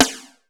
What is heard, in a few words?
TEK.S, TECHNO; 90 sSampleCDs; Crypton - DrumBase5000